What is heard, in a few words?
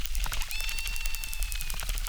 Chirp, acquarium